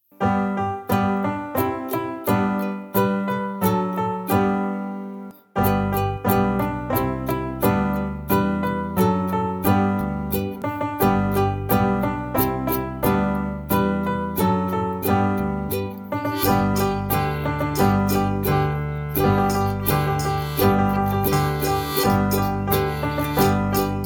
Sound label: Old MacDonald Full Karaoke